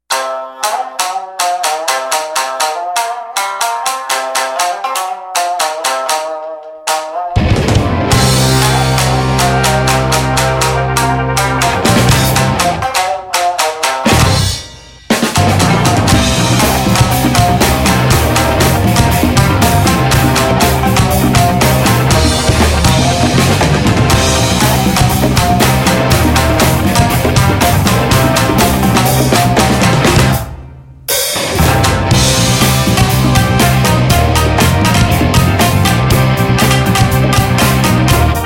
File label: funky time